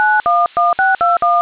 ringin

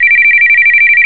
cellular

